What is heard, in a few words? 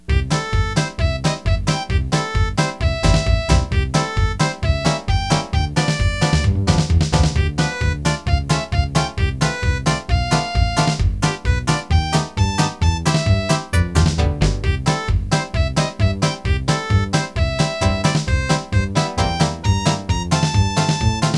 MELODY